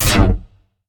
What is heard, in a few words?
Nano Blade